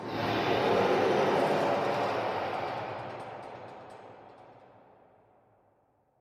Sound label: alert